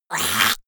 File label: monster choking high; comedy-cartoon; soundpool